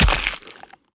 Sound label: Background Otter eat